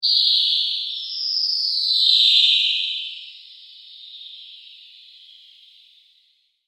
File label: PULSE LONG SWEEP